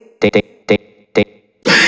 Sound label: House Loops, Vocal Loops House 128BPM